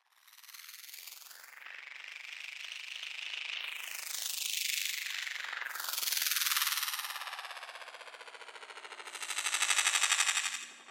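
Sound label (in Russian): вжух усиливающийся снисходящий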